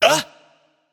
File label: surprised; smite; shiva